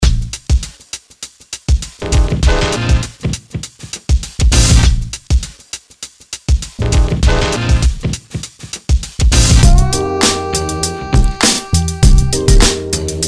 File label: loops